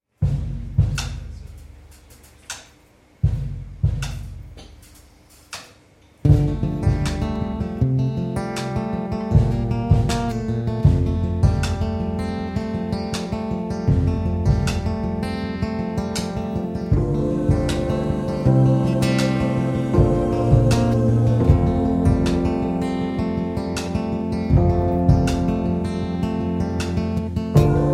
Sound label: stay and wait acoustic version